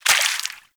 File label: Water Sprayer Surface Impact; Warframe; Weapons